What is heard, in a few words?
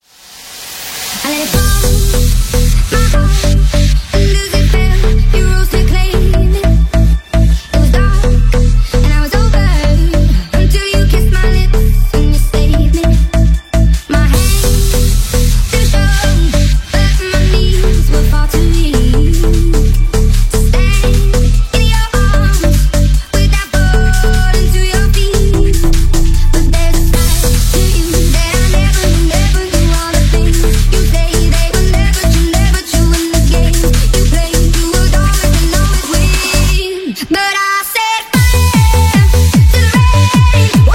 uk bounce